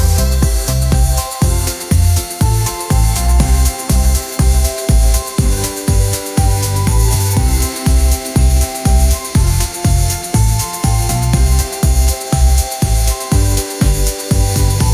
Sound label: pop instrumental